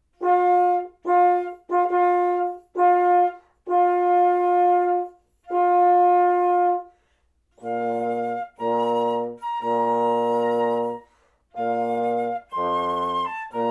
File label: Bridal Chorus; Wagner; classical duo